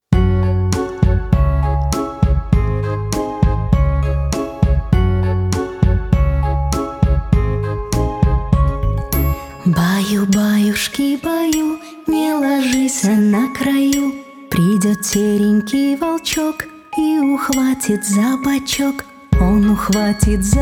Bayu bayushki bayu; Burenka Dasha

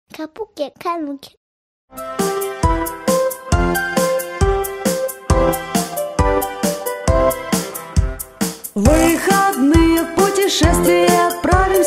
Pesenki pro mashinki

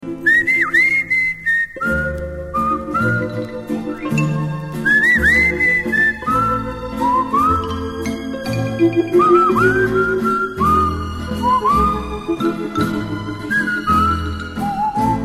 svist volka; nu pogodi

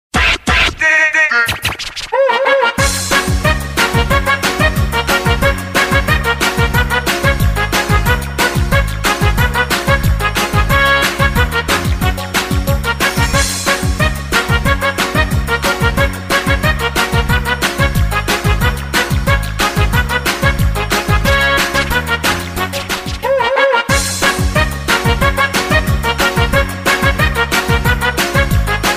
muzyka dlya detskogo prazdnika, Muzyka dlya igr konkursov estafet; muzyka dlya detskih estafet

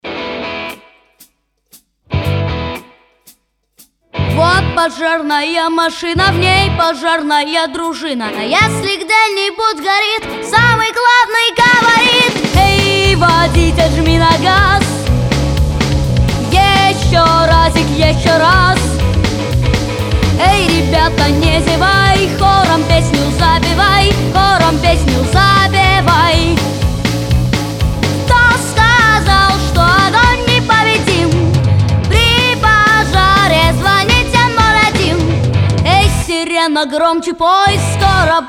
Detskie pesni

